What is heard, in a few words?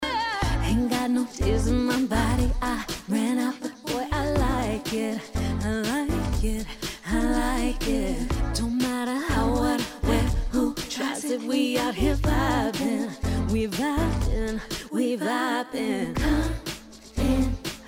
Pop R&B